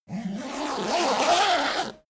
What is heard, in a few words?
zipper midsize run; objekte; reissverschluss